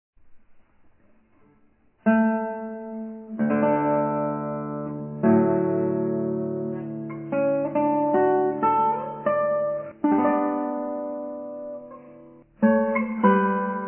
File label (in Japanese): ギター; トロイメライ、タレガ; Reverte